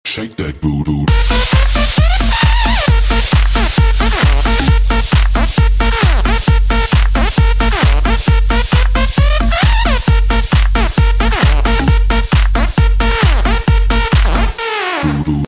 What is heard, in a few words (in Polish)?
klubowe zm